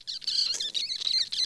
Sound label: rats, dungeon; soundscapes